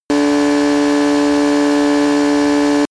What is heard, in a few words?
motorcycle engine medium speed steady; motorraeder; verkehr transport